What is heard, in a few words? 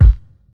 round kick drum single hit g sharp key